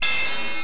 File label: CLASH